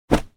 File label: cloth smack